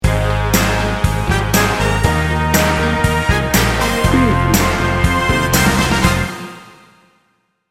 detroit soul sting bumper

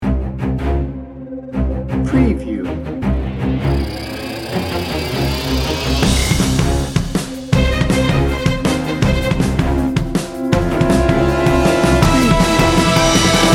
war games

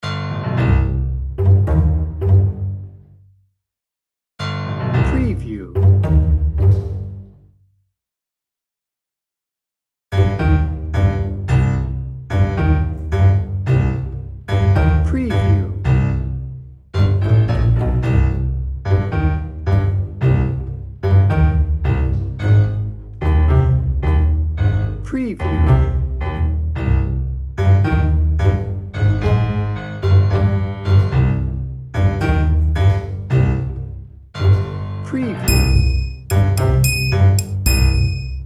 tango bass piano perc

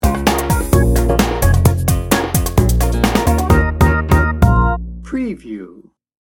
party set sting bumper

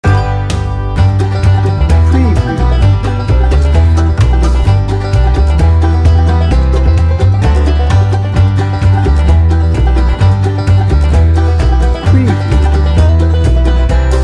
bluegrass blowout bed

